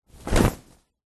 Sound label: foley fabric nylon thin single flap